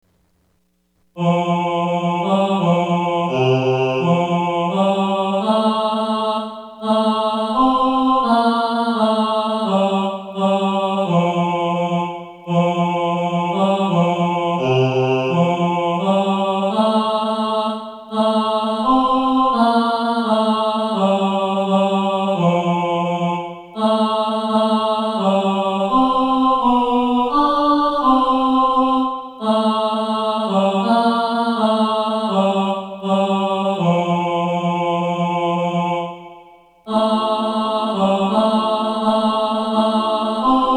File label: tenor, choir